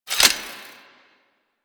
Spear Gun Equip; Weapons; Warframe; Tenno; Hoplite

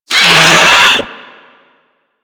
Infested Biped Pain; Warframe; Moa

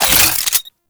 Fulmin Prime Secondary Switch 3D, Weapons; Warframe

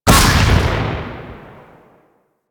Kuva Zarr Shot C, Grineer Cannon, Weapons

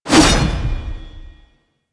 Flame Spear Throw Fire; Grineer

